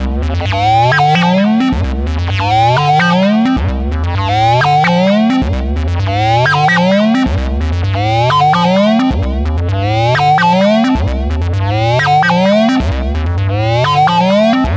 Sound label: NRG SFX, Trance